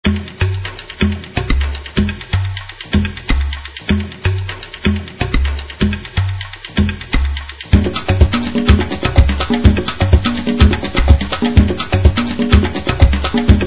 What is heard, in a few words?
dancing timbales